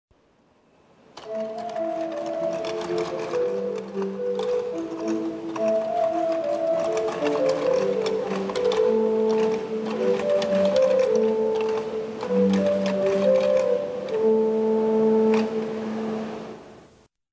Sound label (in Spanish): organo de la catedral